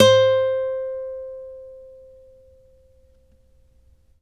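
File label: acoustic finger C 4, guitar; East West; Steve Stevens Guitar Samples Collection; ACOUSTIC FNG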